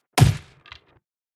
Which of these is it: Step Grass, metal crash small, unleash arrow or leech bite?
unleash arrow